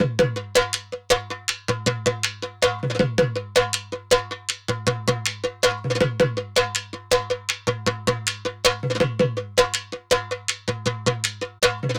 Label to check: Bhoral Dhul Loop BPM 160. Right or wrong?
Right